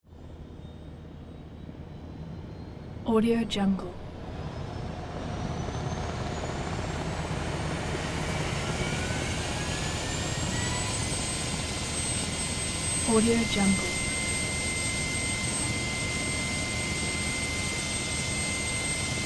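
sikorsky uh60 black hawk helicopter exterior close up; prosoundfx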